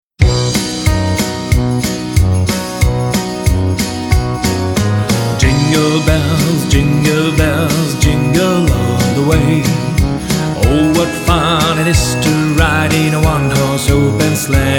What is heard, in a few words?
Jingle bells